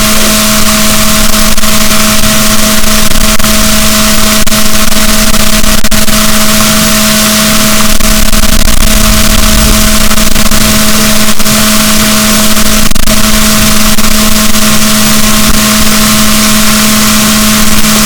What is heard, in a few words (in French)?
rorquals positif, BalaenopteraPhysalus, boat